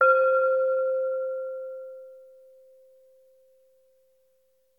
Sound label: Gong